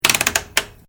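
Switch Oscilloscope Turn Multi Click, Mechanical switches; Electrical Button Sound Effects; Electrical Buttons (Sound Effects:Multimedia)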